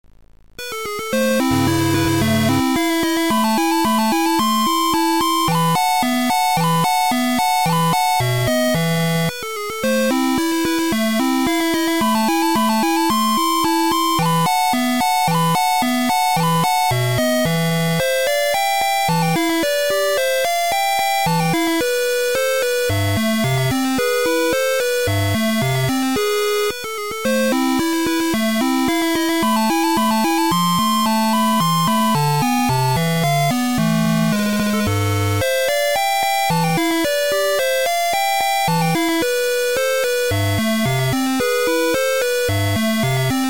alla turca synthesizer

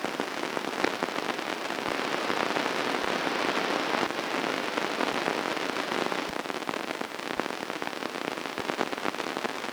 LSB noise

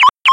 car sound square filtered chirps

